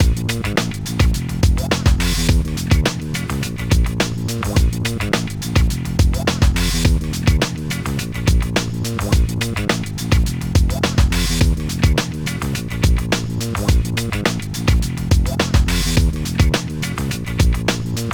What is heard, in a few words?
Load Loop; NBA Street; GC; PS2